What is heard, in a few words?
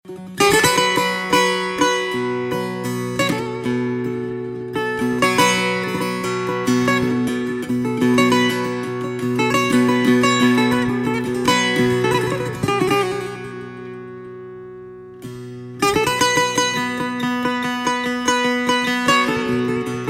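Taknavaziye Setar, setar solo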